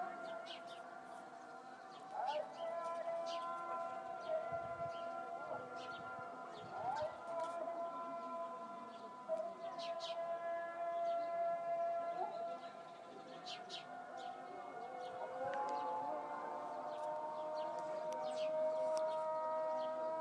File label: call to prayer